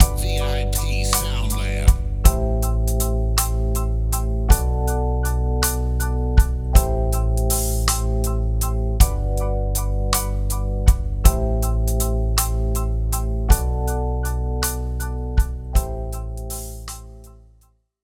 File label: Modern RB